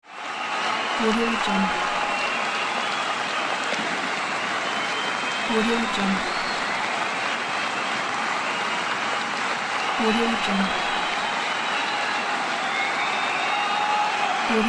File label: stadium crowd